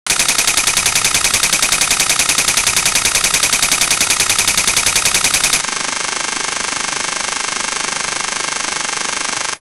6000 shots per minute las, machine gun

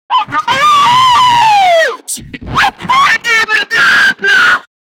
the sound of walking on, shoes

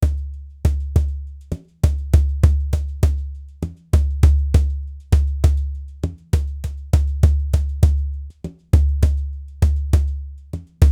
cumbia base drums slow

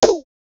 cartoon style impact sound; characters

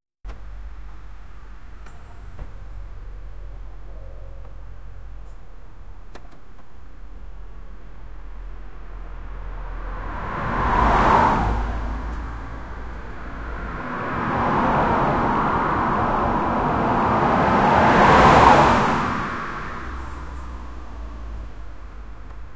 car accident sound

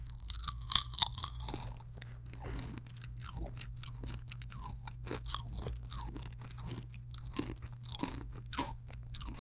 slow chewing of a dessert

